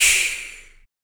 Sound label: SHAKER, MALE PERC; Spectrasonics Vocal Planet; Jazz FX